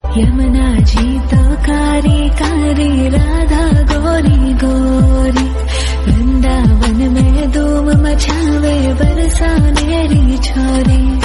female

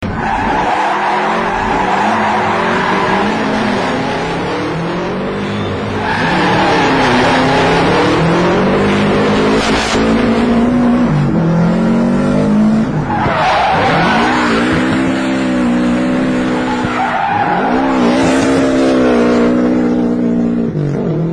car drifting

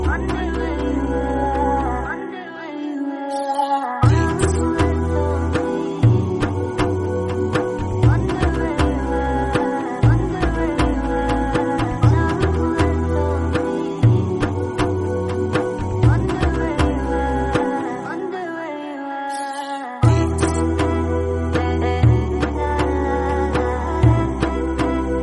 nasheed instrumental halal beats

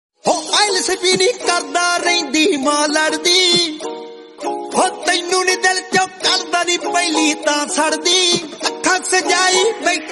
punjabi song

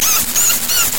woman moan

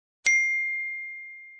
iphone 13 message tone

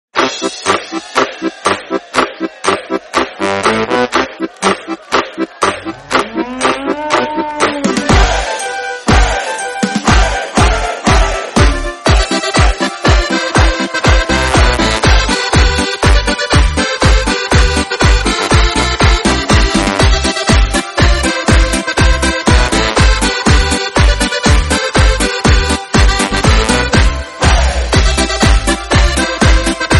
klompendans background music

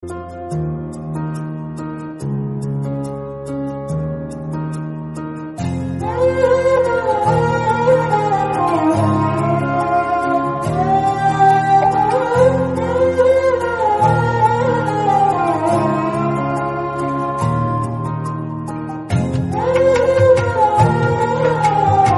feeling proud indian army instrumental bgm

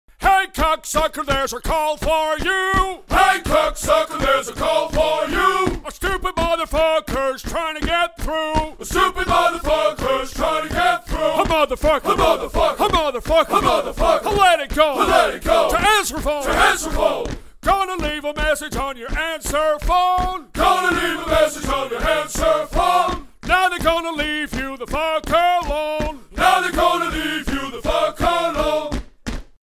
drill sergeant wake up call song

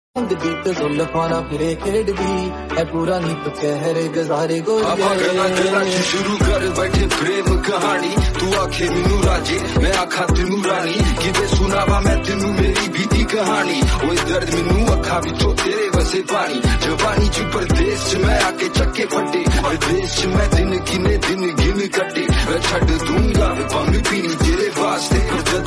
Punjabi remix song

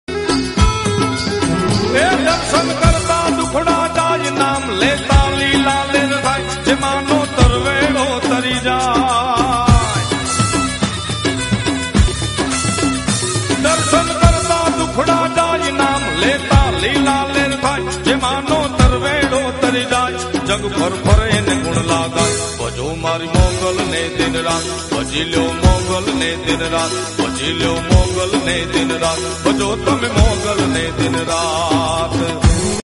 gujarati devotional

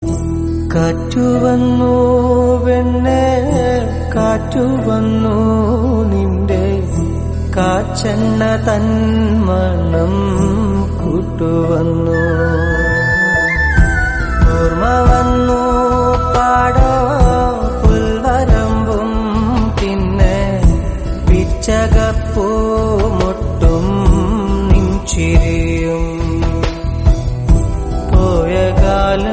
malayalam song

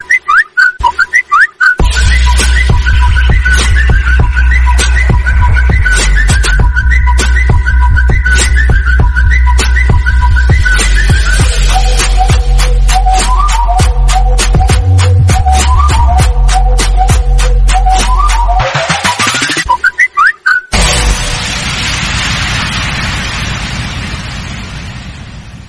samsung remix tune

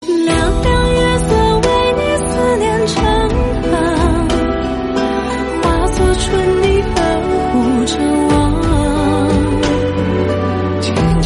chinese romantic

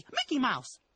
mickey mouse chuckle

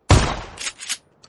shotgun

